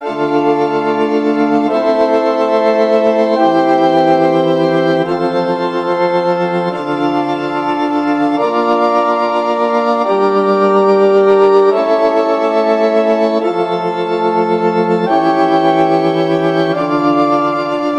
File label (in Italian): Esercizi di contrappunto a tre voci